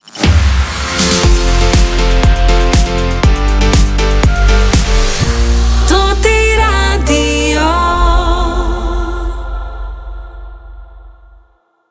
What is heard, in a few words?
Transition Hot Soft, jingles; Toti Radio 2023